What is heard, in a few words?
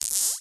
leisure toy buzz rattle magnets click vibrate